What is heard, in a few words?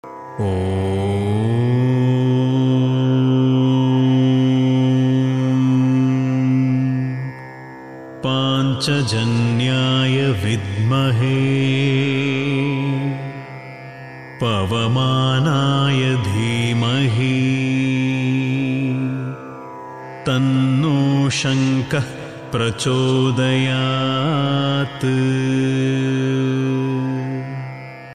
pavamana gayatri